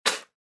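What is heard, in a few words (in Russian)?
zvuki plevka cheloveka, human spitting sounds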